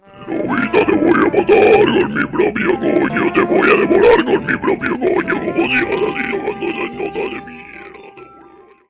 music

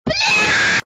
kid scream

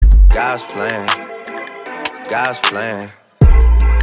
lalalalal

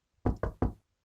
knock knock knock

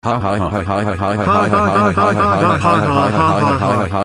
ahahah

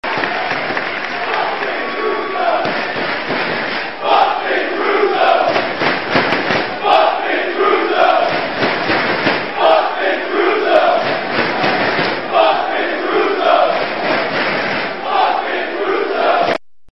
crowd the greatest wrestling chant; roh

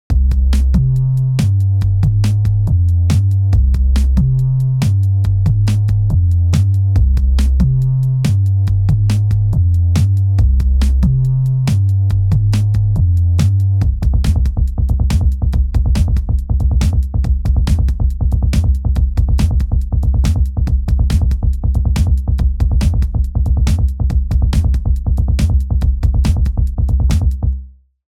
the pop song

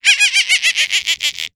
cartoon baby monkey laugh; mixkit

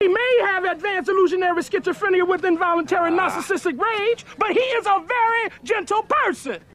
rage

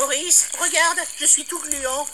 zelda fairy fountain epic

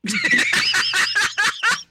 laugh

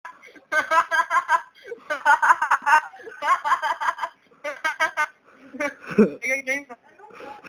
ahahahahaha

